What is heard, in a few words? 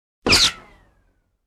bullet ricochet; کمانه گلوله